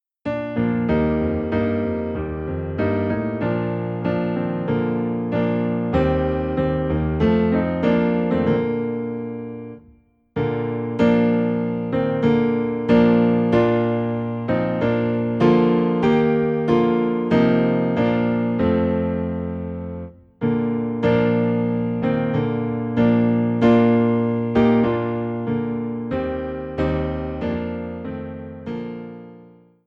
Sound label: Piano Low in B Flat, hymns; At the Cross